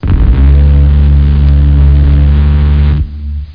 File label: minigun